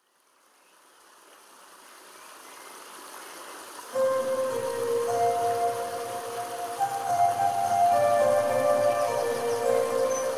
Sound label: Spiritual Reawaking (River In The Asian Forest)